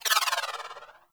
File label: melee charge turbo dash activate; Warframe; weapons